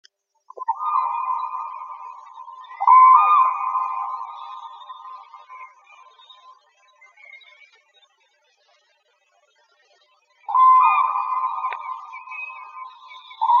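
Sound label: common crane voice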